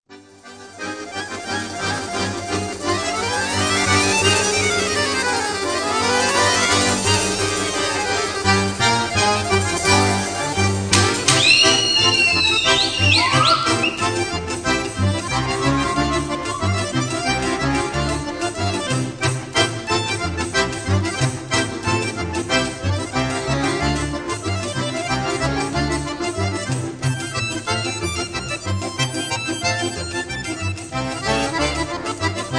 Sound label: instrumental